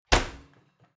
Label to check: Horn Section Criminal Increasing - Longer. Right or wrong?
Wrong